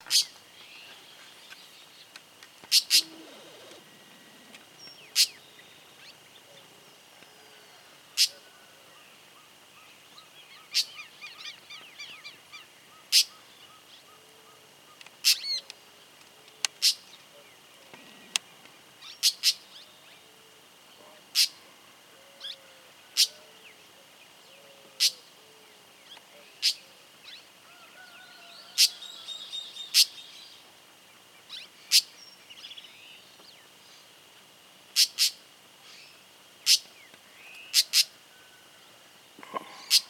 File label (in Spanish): calandria real voz; aves